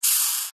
animals insect rhinoceros beetle hiss single

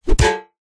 impacts swoosh fast into metal thud hit clunk

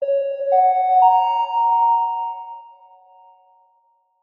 public places annoucement tone reverberant

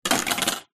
clothes hanger metal wire drop wooden floor; household

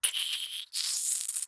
Gore Squeeze Spurt

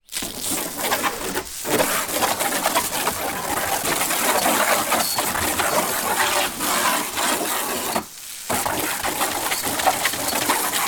transport boat kayak wash down spray with hose

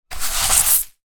household sweeping leaves broom single; zapsplat

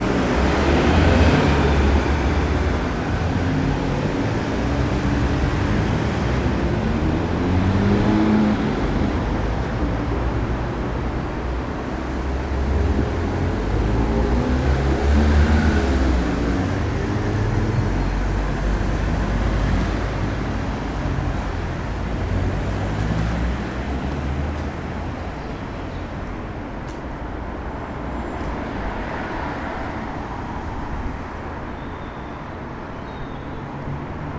transport traffic med town road

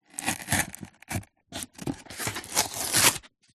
cardboard box small glossy skin rip; foley

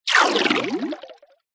multimedia game designed water movement

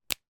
toy loom band snap

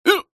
human male single hiccup

